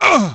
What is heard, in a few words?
Ranger Pain